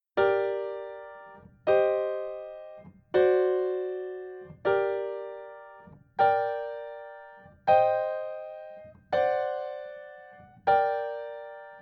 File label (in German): Harmonische Kadenzen in g Moll; Übung 2